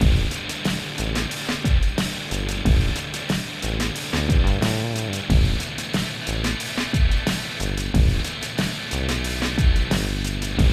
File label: Club Music; Paris